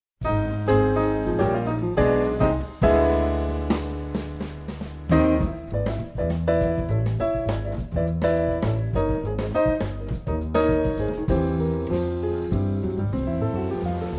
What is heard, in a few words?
Polonaise C Minor